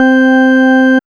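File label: organ tone; X-Static Goldmine 1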